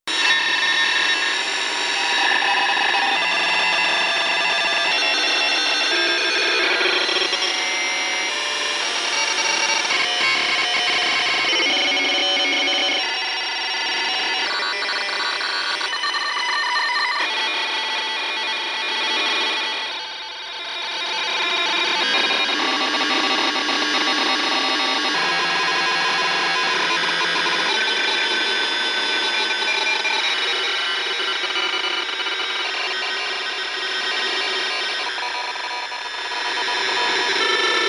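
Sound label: Radio short wave Rhythmic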